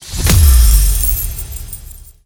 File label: big win text change; vegas night life